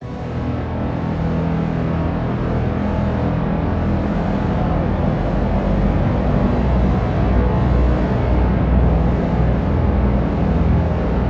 bass drone thick reverb, Emin, 120

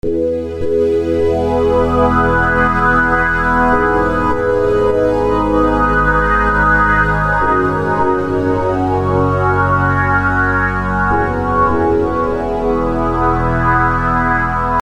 Trance; Iridium

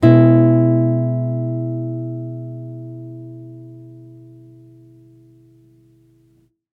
Plucked C Major 80 BPM

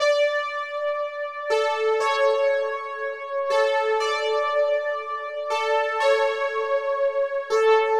Lead Synth 120bpm A

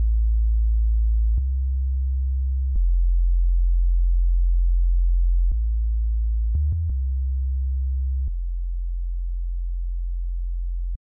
C, 174bpm, bass; Bleu Moon 7

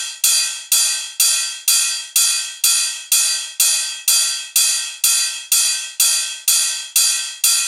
125 Drum Loop Ride

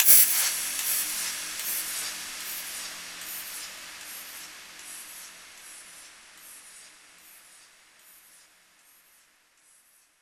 Crash

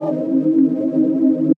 C Synth Hit

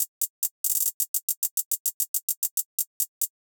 140 Boom Hats

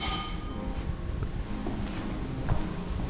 Elevator

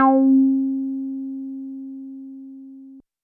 Square Hi C4

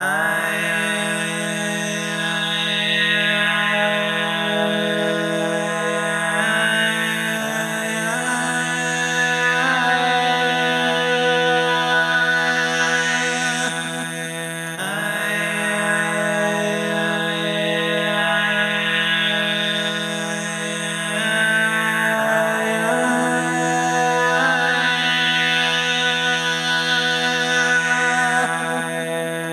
130 E Pad Vox; Easternova

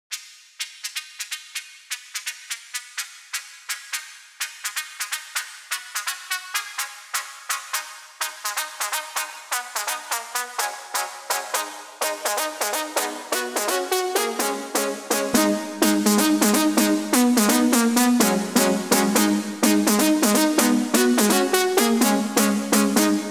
drop lead wet 126 G

